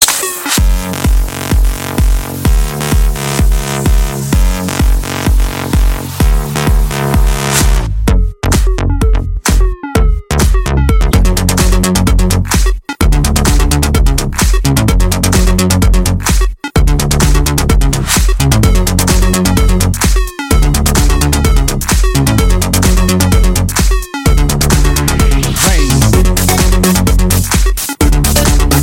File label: elektropop ringtone